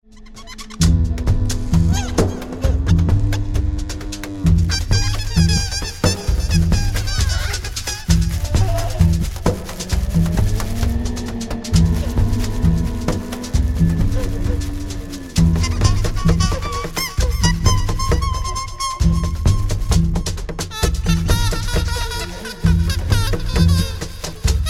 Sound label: Carnaval